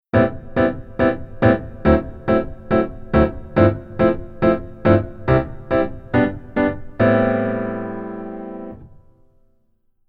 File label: Keyboard Rhythm Basic